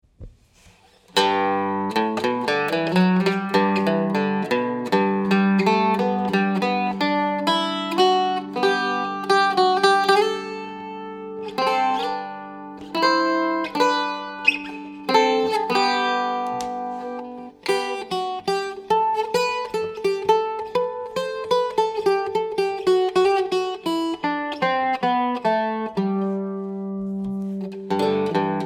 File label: random, octave mandolin